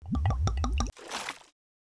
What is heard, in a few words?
pour